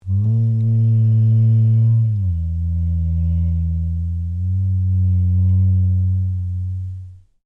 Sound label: Created creature large sing; Created creatures, vocalisations and pretend speech; Aliens; SoundEffects Foundation2